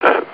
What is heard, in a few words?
short wave spurt